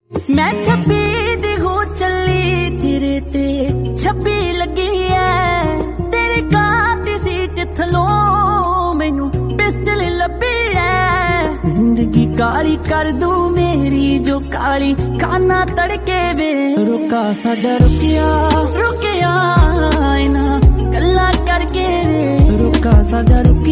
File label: punjabi song